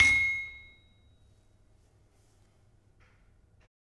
Peking, gamelan

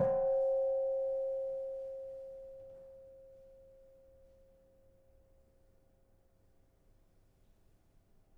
Kenong soft, gamelan; gravityharps; timbrebox

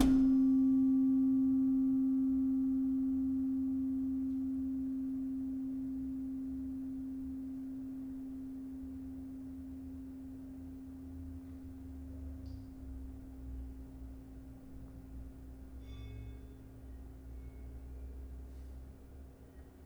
Slentem soft, gamelan; gravityharps; timbrebox

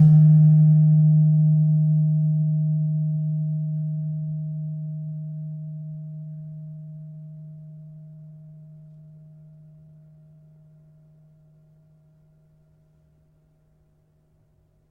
Slentem 3D Sharp, gamelan tuned; gravityharps

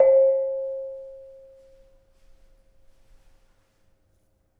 Bonang Panerus, gamelan

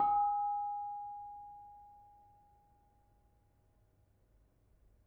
Bonang Barung, gamelan

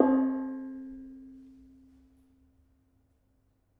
Bonang Barung, gamelan

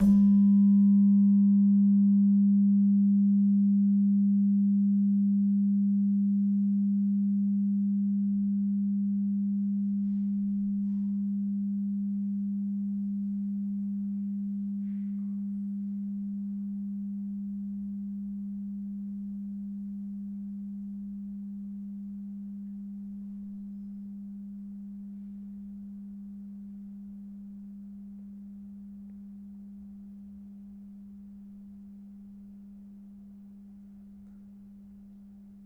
Slentem, gamelan

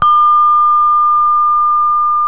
hi gangsa mid, gamelan; gravityharps

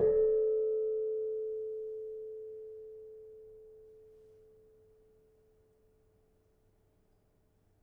Kenong soft, gamelan; gravityharps; timbrebox

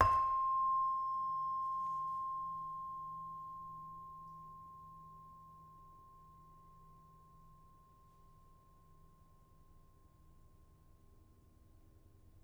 Saron hard, gamelan; gravityharps; timbrebox

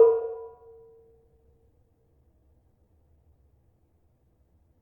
Barung 4 A sharp, gravity harps tuned

